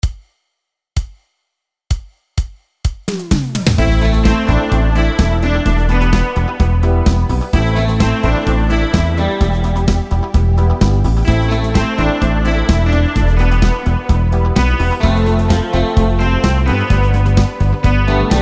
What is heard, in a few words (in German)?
E-Gitarre Drawbars Schlager; Wersi